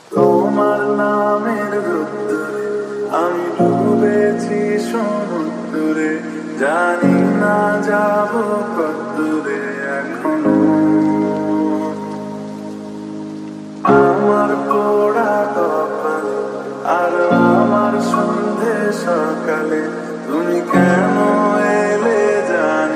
Bangla Romantic Ringtone; ringtones

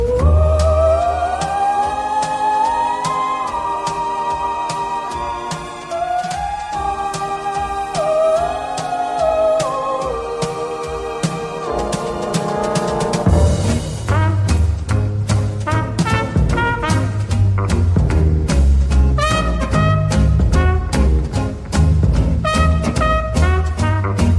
Orchestras Instrumental

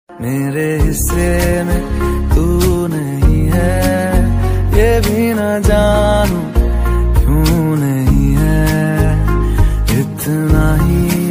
Sad Hindi Song Ringtone; ringtones

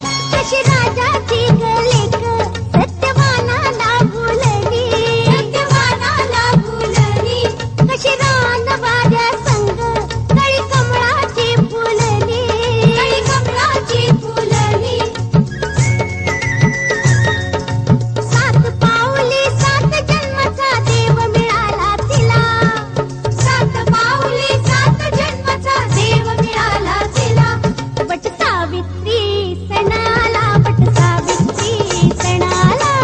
Marathi Song Ringtone

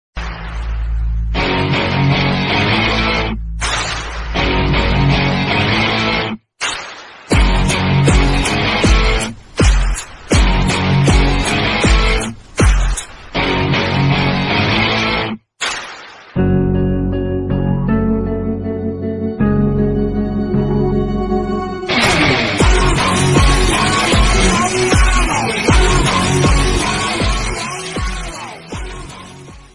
BGM Telugu Ringtone; Arjun Reddy

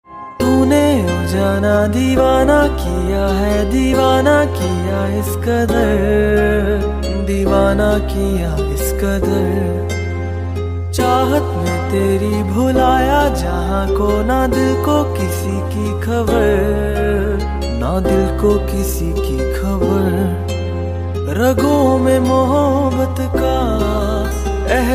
Old Hindi Song Ringtone

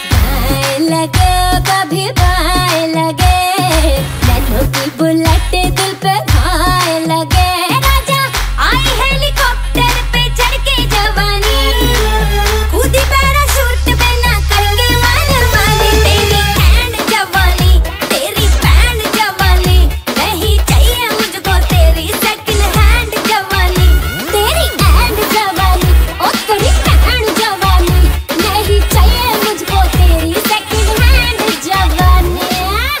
Romantic Female Song; ringtones

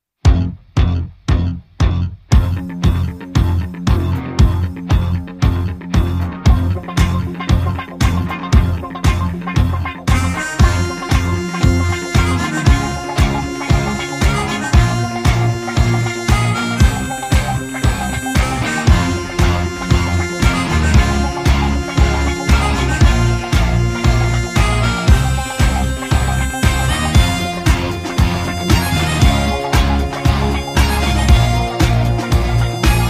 War Movie Theme Instrumental Ringtone

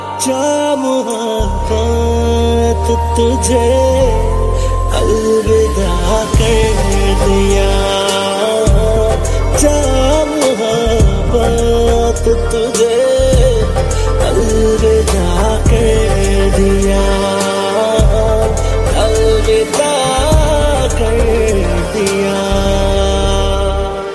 Sad Song Ringtone

